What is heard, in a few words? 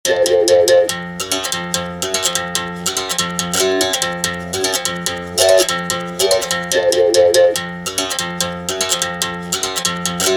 brazilian berimbau capoeira